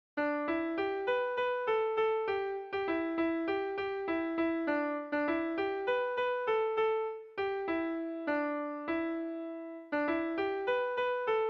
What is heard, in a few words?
Midi; Ob Sonnenschein